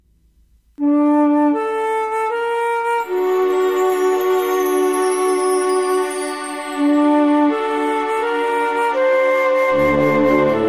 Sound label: Orchestra Test; was actually just an experiment with a demo I had downloaded to try out some orchestra sounds.